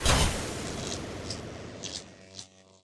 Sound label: Tile Shock